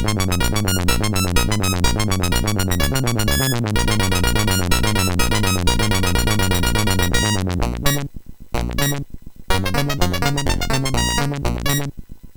sid MOS6581R4, GAMES; Araknifoe

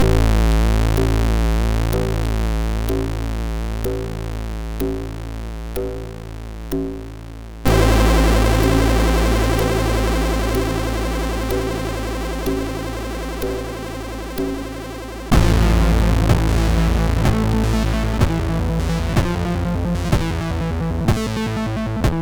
Church Bells, MOS6581R2

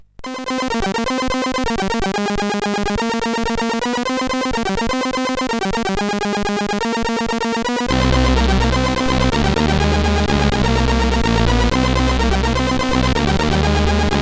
basebeat, CSG8580R5

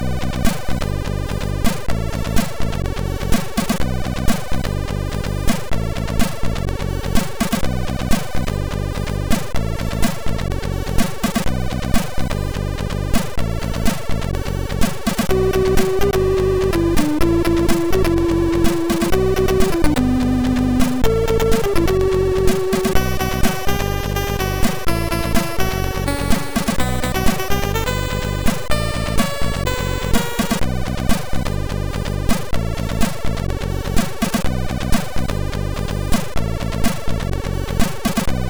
Rhytm Zone, MOS6581R2

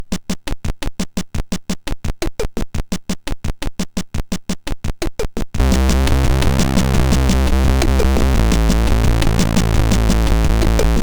sid, MOS6581R4; Euratom Intro

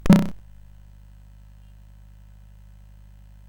CSG8580R5, GAMES; Street Rod

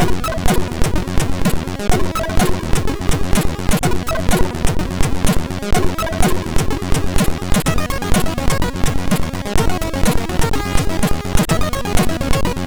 Music Test, MOS6581R3

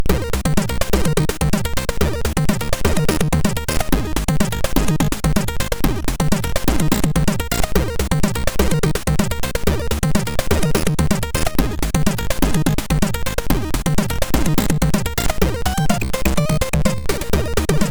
Short Music, MOS6581R4

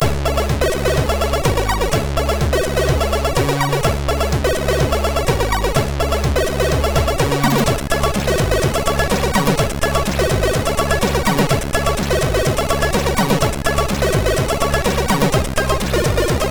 tune, MOS6581R3; Lascivious

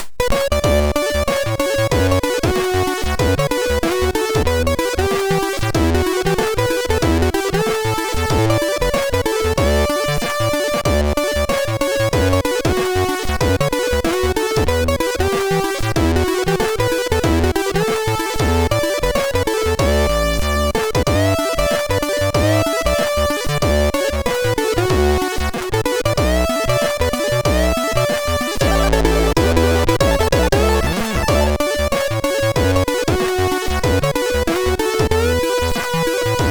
Christmas sid MOS6581R3

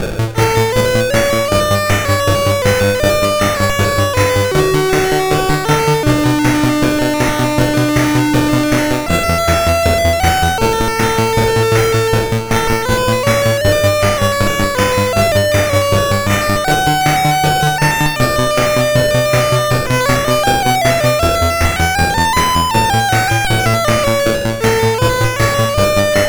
Synth Sample III, CSG8580R5

